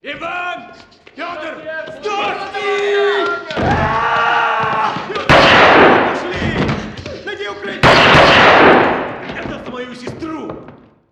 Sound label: assault int killing; village; Call of Duty Modern Warfare